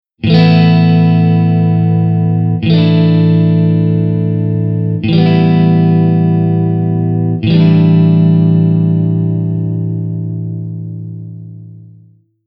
Campo Maior Acordes A2 A4 A72 A74

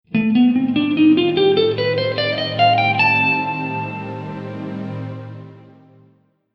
Escala de A Locrio 9M

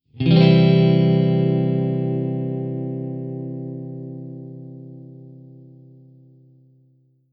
Acorde de Triade de C

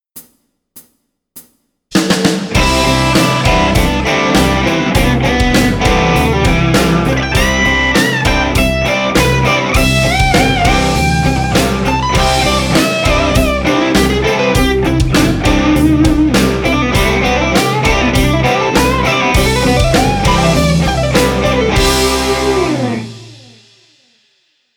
Guitarra Penta Em7; Dicas de Guitarra

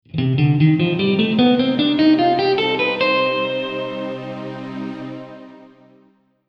Escala de C Aeolio 6M 7M

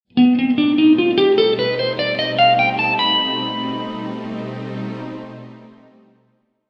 Escala de B Alterada

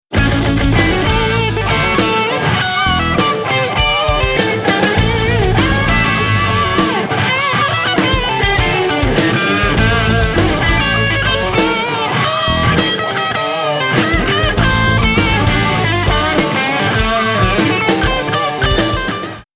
Blue Tone Solo do Riff, guitar

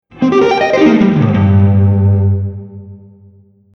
Tetrade de Cm7 Arpejo, guitar

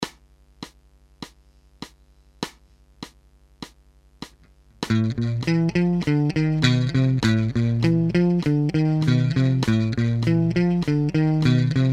Exercicio base, guitar

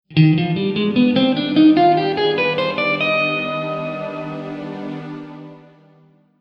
Escala de Eb Jonico 5

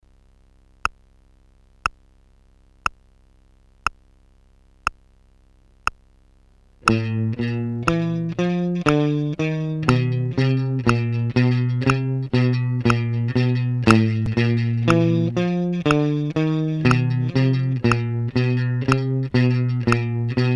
Exercicio, guitar

